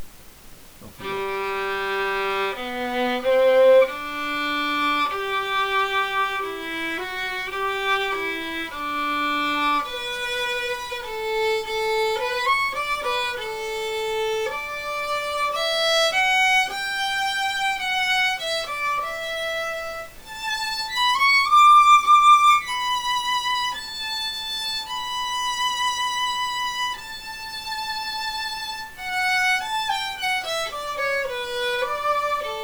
Violin Soundsample Crest No post bridge

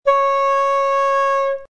C sharp 5, flute; modern

